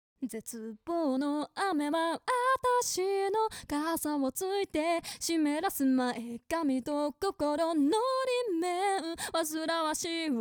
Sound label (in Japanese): イコライザーオン